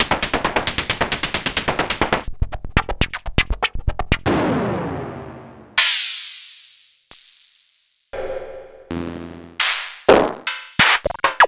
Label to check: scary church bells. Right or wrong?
Wrong